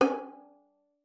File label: FING G#5, VIOLIN; Xsample VOL-5